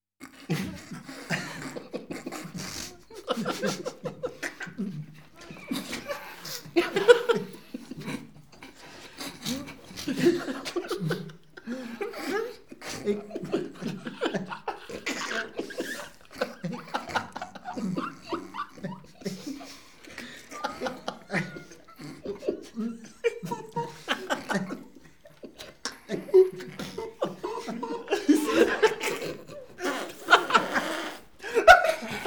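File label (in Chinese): 偷笑(男众人、成年)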